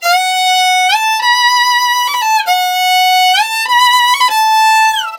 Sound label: VIOLIN, Balkanish Traditions